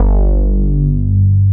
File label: WET BAS, JD800 BASS; East West - Global Sounds